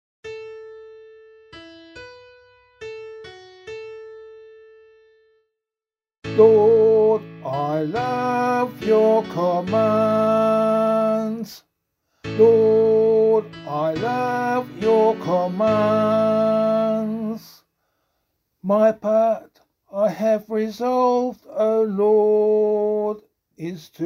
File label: Psalm vocal